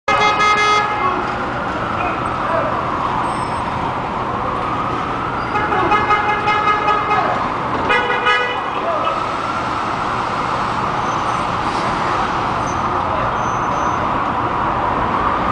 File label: Street Sounds NY East 51st